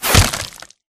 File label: bullet generic head kill shot human; Titanfall 2